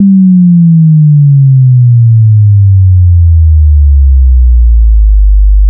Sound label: SFX, Trance NRG